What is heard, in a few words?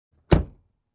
Closing Car Door